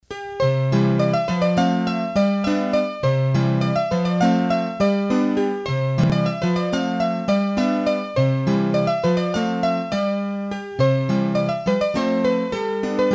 Les Cinq Noisettes, Bourees d'Auvergne, hurdy-gurdy